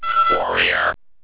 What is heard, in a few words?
blip; VitalWatch; warrior